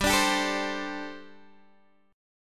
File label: G Suspended 2nd Suspended 4th, Guitar Open G